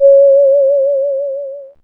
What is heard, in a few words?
C#, Synclav FM, WIND; Big Fish Audio